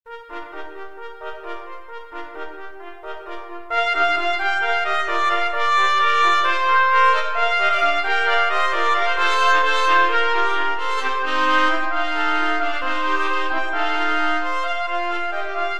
trumpet trio

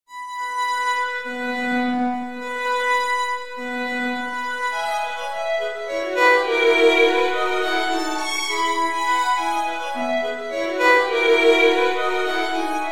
violin trio

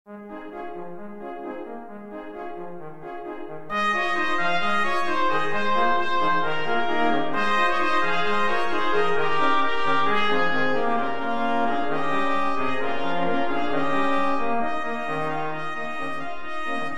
brass quartet

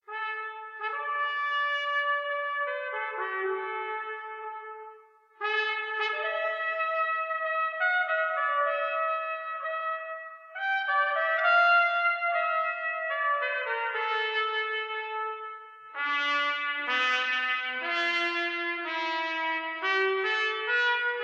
Cello

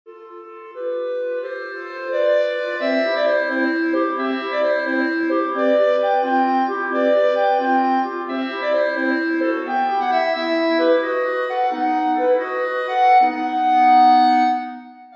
clarinet trio

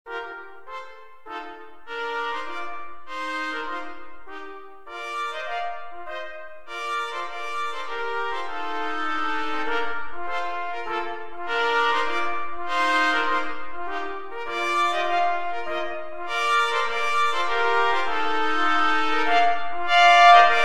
trumpet trio

